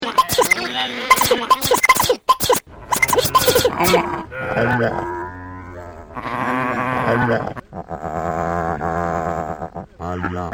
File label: sheep and sneezes